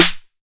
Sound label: 808 SD Tape Sat, Snare Drum